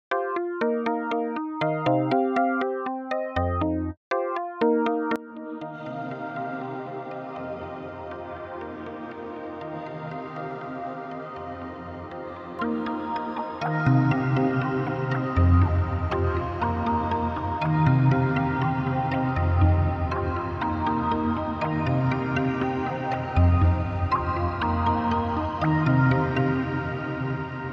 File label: karplus test; (dry then wet); Reflectosaurus; JSFX and scripts for reaper